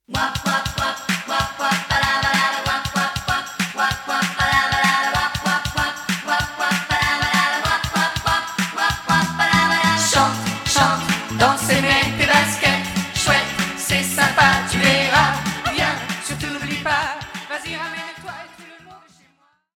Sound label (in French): Chante